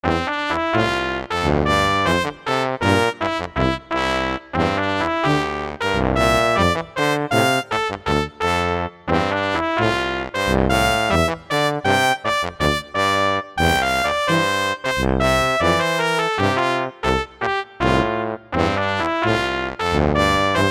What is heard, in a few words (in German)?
Alpine Volksmusik Blaesertrio